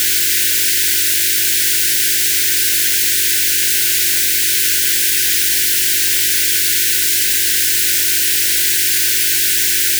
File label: noisy msg